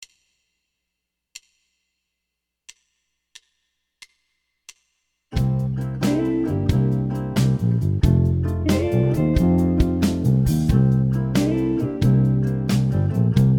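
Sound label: Slow Blues 90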